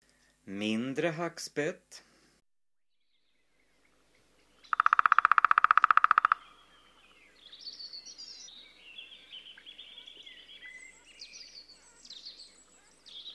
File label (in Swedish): Mindre hackspett